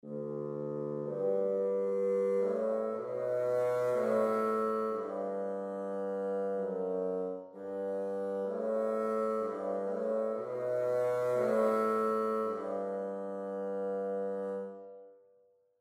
Woodwind Solo Bassoon Legato; Artinoise Recorder; DAW

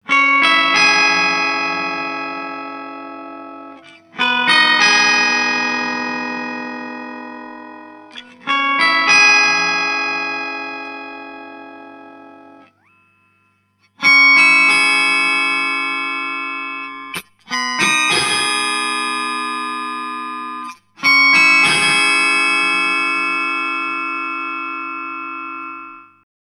Boss ME 90 Pitch

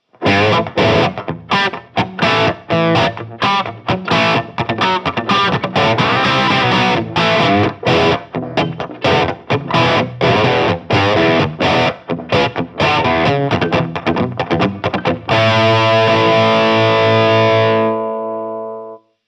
Schecter SLS Elite C 7 Crunch Modern

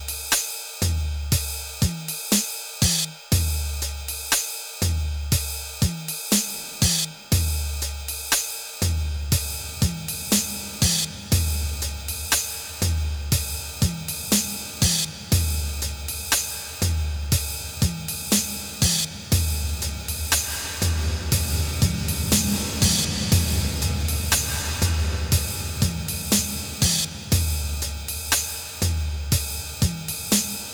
NTS-3 Drum Hall reverb

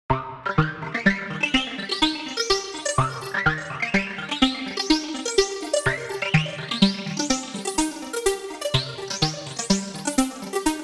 Mini Brute V; Quintessence

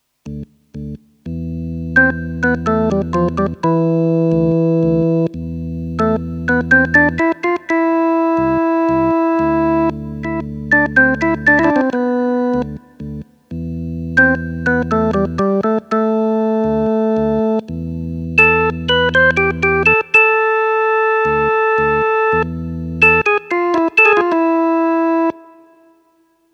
Hammond XK 4 Third Percussion

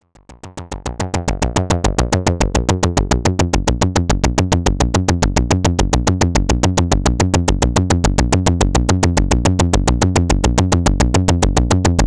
Bassdeformation; Body Synths Metal Fetishist

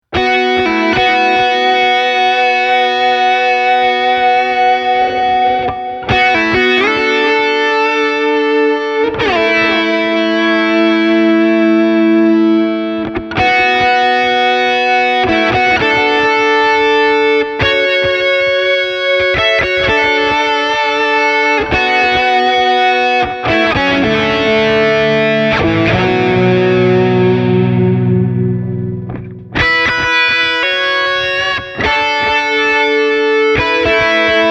Harmonizer; Nux Trident 85A Royal Jelly

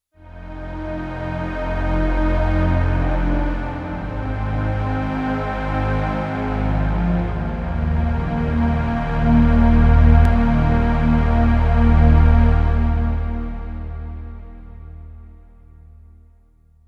Pad SH-201

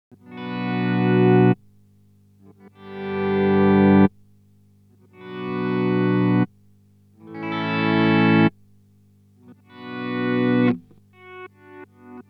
EHX Pico Attack Decay Mono Mode Slow Attack

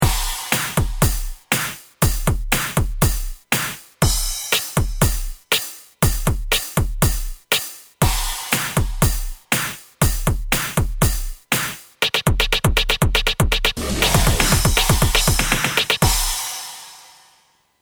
Yamaha FGDP 30 Set

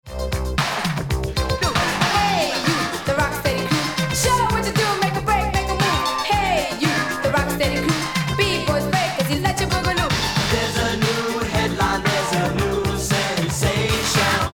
Ortofon Concorde Club MKII

